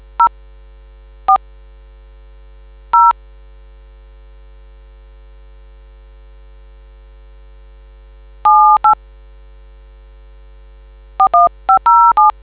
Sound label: dtmf